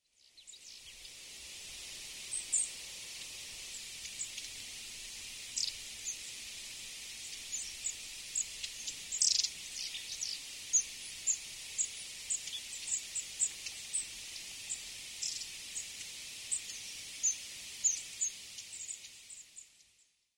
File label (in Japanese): shimaenaga, tomamae